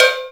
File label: COWBELL, Ragga & Dub Reggae, BELLS KIT; USB Soundscan vol.27